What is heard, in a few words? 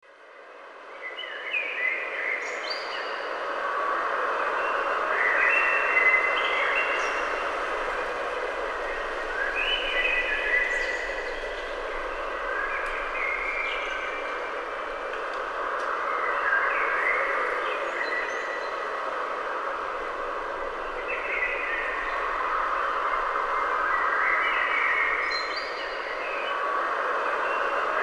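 forest ambience; Wario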